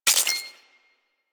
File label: Ship Rolo Dex Tap; Warframe; Corpus Ship Remaster